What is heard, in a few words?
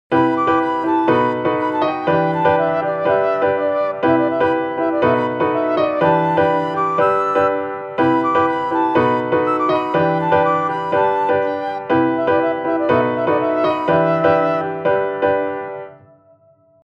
Session Player Block Chords Arrange; バリエーションを加えた状態; Logic Pro 11; 交互にパターンが切り替わる; 8分音符を継続